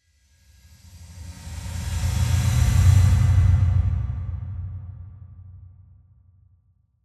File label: Whoosh Heavy Deep Tension Pass; Hollywood Edge; sound designer's toolkit v2